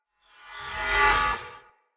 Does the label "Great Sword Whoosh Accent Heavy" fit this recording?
Yes